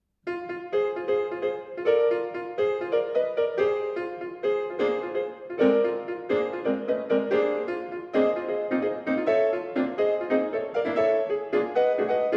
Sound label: stride dance